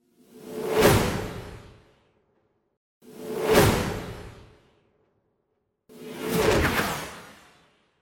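three variants, two in different directions, the third with a swirl; Звук ветра для перехода в видео или для монтажного перехода в аудио монтаже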